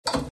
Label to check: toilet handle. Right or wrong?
Right